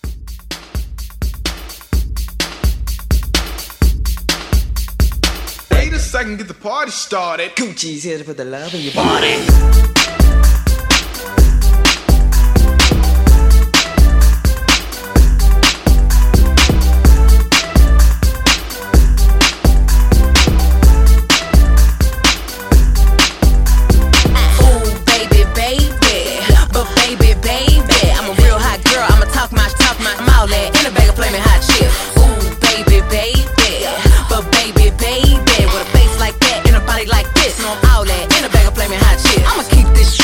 Mashup Re Drum 127

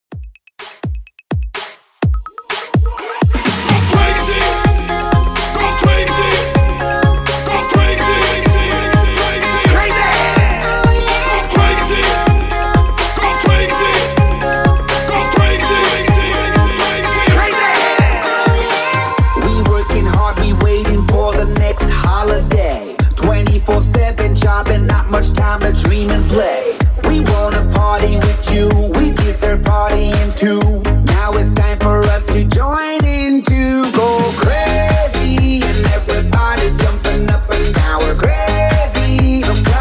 MASHUP 126 BPM

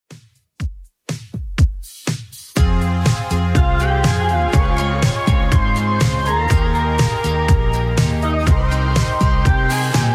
Top40 Redrum 127bpm

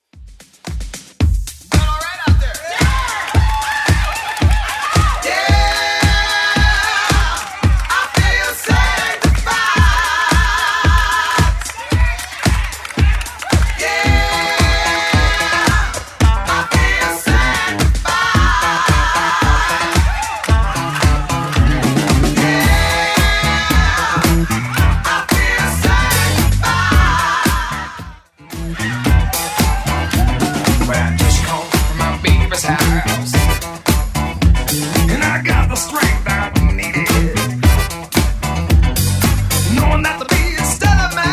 80s Club Edit 112bpm

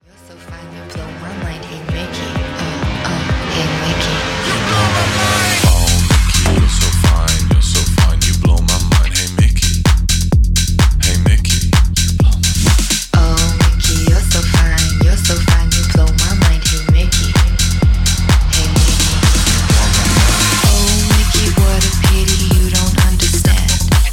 Snippet Tech House 128bpm Fm

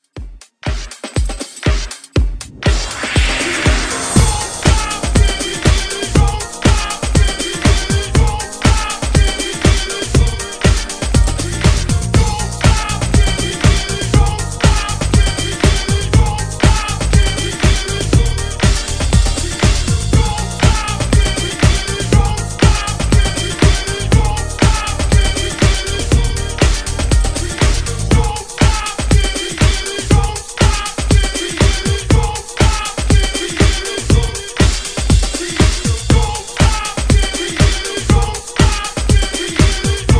90s Hype 120 Bpm; DJDOLLS

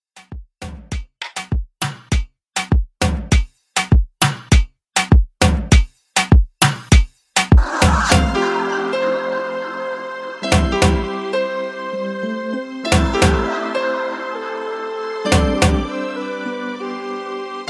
Moombah Mixshow 100Bpm

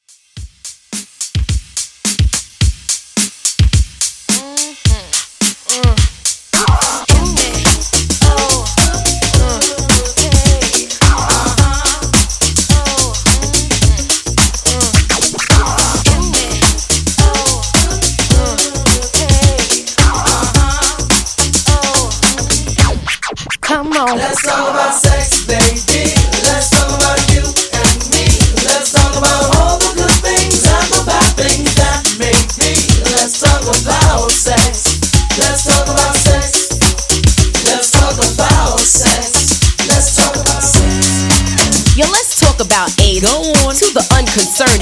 Classic Redrum 107 Bpm

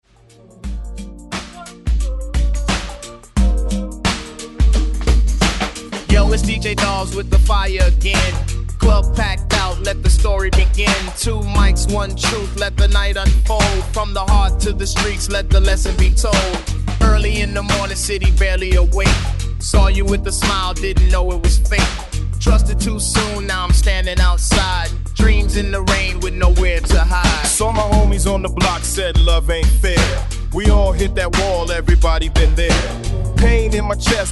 90s Redrum 88 Bpm; DJDOLLS

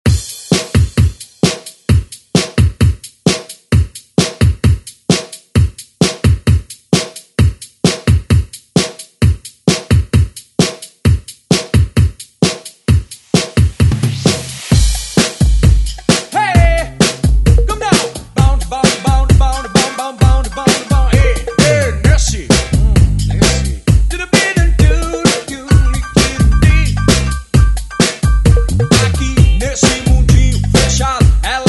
90s ReDrum

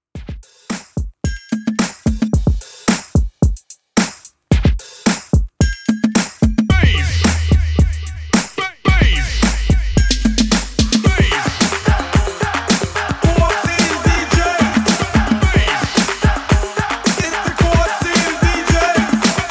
Classic Redrum 110Bpm; djyan

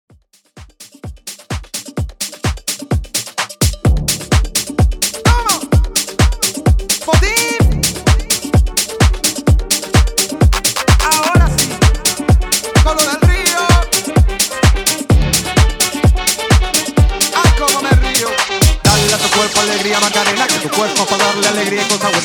128 BPM; MASHUP

